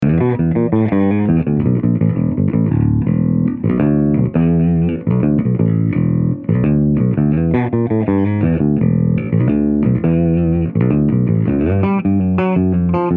Crush100BXT Fingers Ibanez SR1205

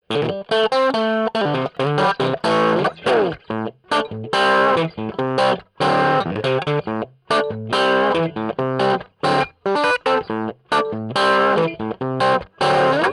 Stereo Micro Crush Overdriven Ibanez S470

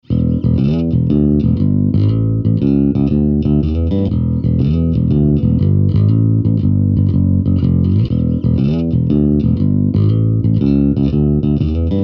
Fingers Ibanez SR1205

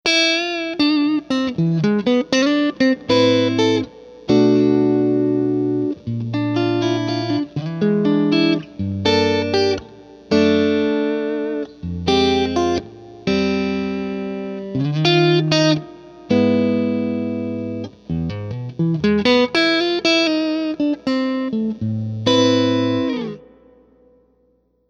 Clean Tele; Nash

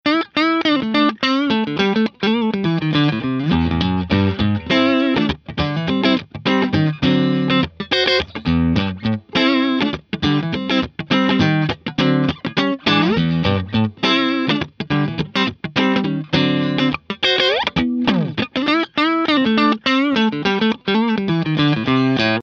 AD30C Channel2 Nash Tele

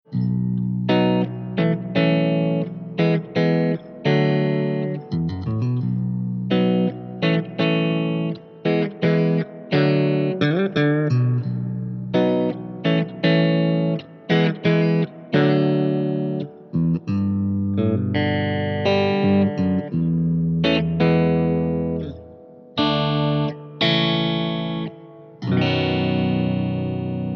Thunderverb 50 Clean Line6 JTV89

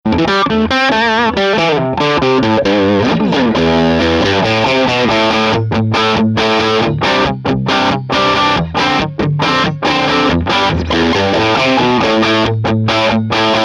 Channel 1 Gibson SG

